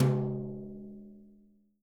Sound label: BRUSHES, Living Drums